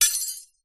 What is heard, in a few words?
impacts glass piece broken light bulb fluorescent drop break smash